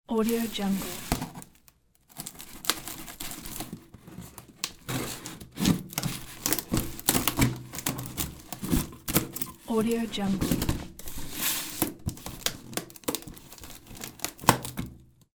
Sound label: opening cardboard with knife